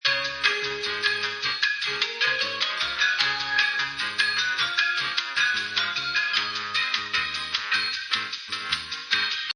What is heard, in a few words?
elevator Music Loop